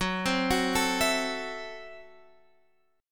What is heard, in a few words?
Gb Minor 7th Flat 5th Gb, Guitar Half Step Down